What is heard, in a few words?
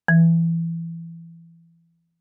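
Marimba yarn fortissimo E3; MIS Pitches - 2014; Percussion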